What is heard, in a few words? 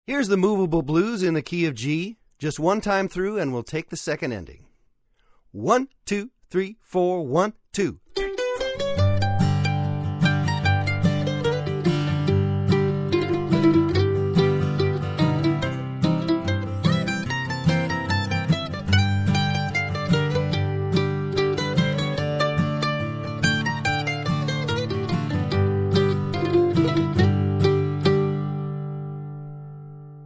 Moveable Blues in G